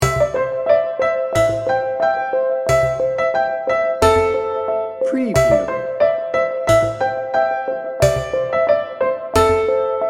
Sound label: child like and upbeat